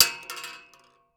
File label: Weapon Bullet Shell 12GA Dropping On Metal; AdobeCC